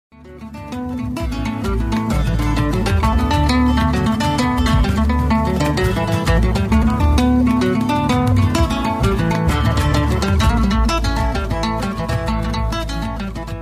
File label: porter house, guitar